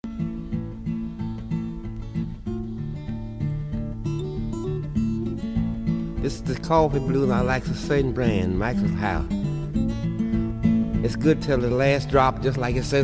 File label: solo acoustic Mississippi Delta blues